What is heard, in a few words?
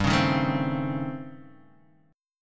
A 7th Sharp 9th Flat 5th